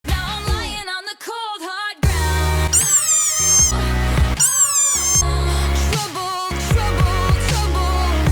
desert rain frog

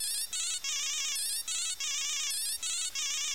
ringtone; malfunction; GTA IV

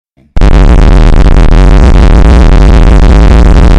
triggered bass booster

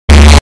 sword; chameleon lego

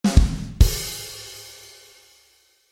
ba bum tss joke drum

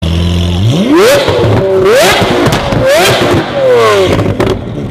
flames lamborghini aventador; insane

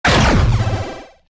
supershot rifle shoot; pixel gun 3d

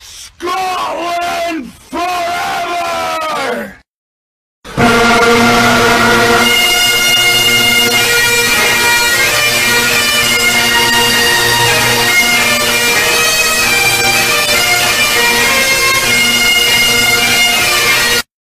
bagpipe; scotland forever

